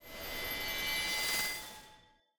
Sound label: Holy Magic Charging Up Spell